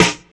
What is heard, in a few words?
2000s crunchy rap snare drum sample f key, steel snare drum tuned to the F note